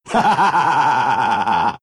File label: laugh; Chen